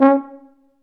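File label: brass F tuba, staccato; Solo Brass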